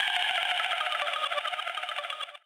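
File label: guitar drip; Rawcutz; Nu Forms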